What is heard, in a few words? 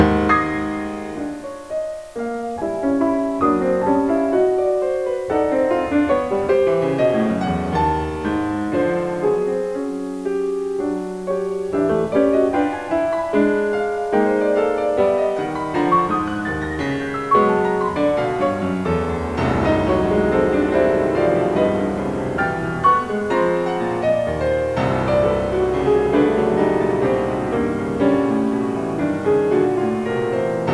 piano